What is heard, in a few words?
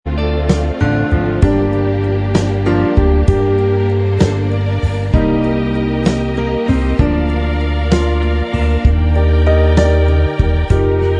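instrumental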